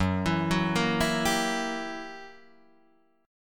Gb 7th Flat 9th Gb Guitar Open D

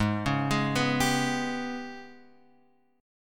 Ab Minor Major 7th Double Flat 5th, down; AbmM7bb5; arpeggiated

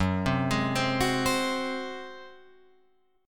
F sharp Suspended 2nd Flat 5th, Guitar, Open F; arpeggiated; down

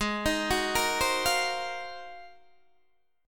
Ab 13th down Guitar DADGAD; Ab13 arpeggiated

